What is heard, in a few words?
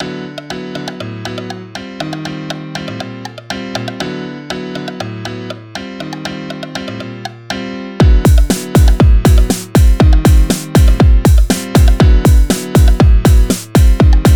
vibes